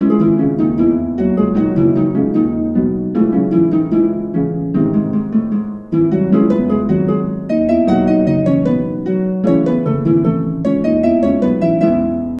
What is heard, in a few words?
Harp; Sanctuary Score BT3; The Bard's Tale Remastered Trilogy